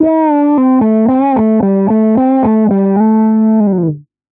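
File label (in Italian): dist wah, Esempio catena distorsore e wah, chitarra; wah wah cry baby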